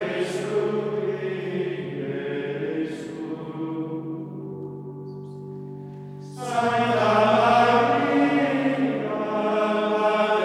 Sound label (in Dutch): Koor mannen Gregoriaans; Ave Maria